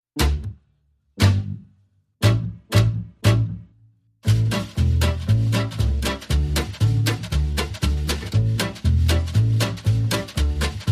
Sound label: Rhythm Section Only